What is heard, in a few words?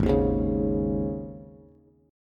Ab Suspended 4th, Bass; Absus4 strummed; 4,6,6,6; JGuitar